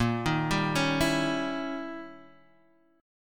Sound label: Eb 7th Flat 9th, Bb